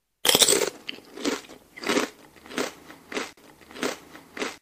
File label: eat